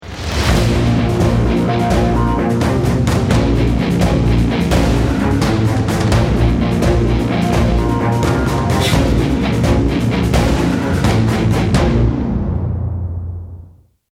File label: start action